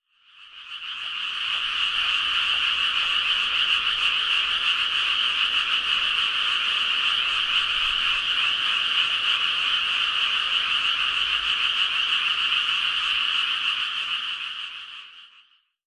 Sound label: amagaeru; hino; koe